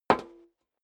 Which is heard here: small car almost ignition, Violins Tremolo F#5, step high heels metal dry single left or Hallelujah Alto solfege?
step high heels metal dry single left